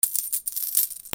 MONEY

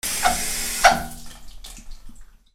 BATHROOM

